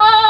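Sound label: Chamberlain voice; AKAI S6000 CD-ROM - Volume 1; SYNTHESIZER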